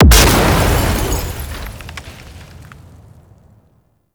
Car Explosion; Warframe; Ambience; NineteenNinetyNine

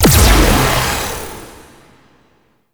Teleport In; Gameplay; Warframe; NineteeenNinetyNine; PvPvE

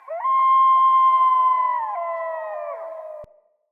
Wolf Cub Trapped Call Distant; Animals; Wolf Cubs; Orengal Wolf Cub C

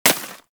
Bullet Impact Debris Snow

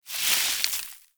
Hoverboard Terrain Caster Snow; Warframe; Vehicles; Venus

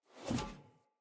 Sphere Polearm Whoosh Small B, Weapons Player

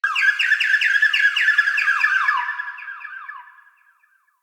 Forest Open Glade Bird Med Two; Day

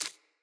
Root Spider Step Dirt, Foley; Animals

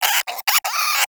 Cryptilex Vocal Alerted, Hunting Critters; Characters; Warframe

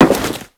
Crewman Step Default, Footsteps; Warframe; Corpus

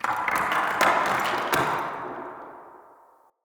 Day Rock Slide Cliff

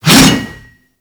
Axe Swing; Warframe; Weapons; Swings

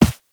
Operator Run Default, Footsteps; Warframe

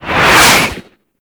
Tank Cannon Projectile Whiz; Enemies; Weapons; Lasrian Tank; Warframe

